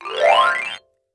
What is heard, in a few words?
Xylophone gliss up stereo; MIS Pitches - 2014; Percussion